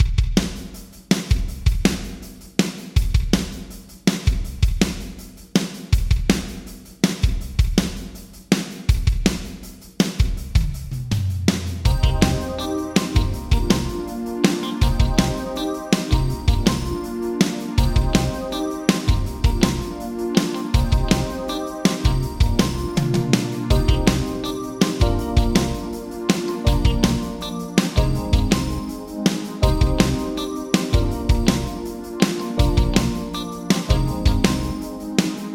Drums Yamaha ProR3 Small Dark Hall 1.5s PD15ms Vintage Digital